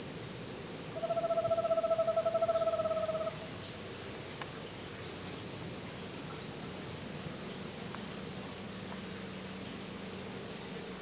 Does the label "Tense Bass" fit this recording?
No